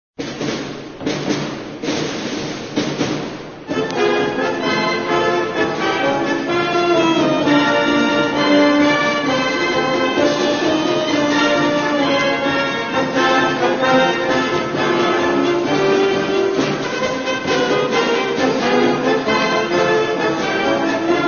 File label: Victory March